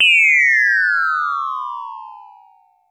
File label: Sci fi